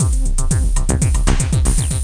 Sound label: Make Music; Cloudz 1.0.3; Soundz